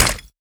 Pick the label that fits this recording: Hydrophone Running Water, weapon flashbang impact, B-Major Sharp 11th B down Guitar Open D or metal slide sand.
weapon flashbang impact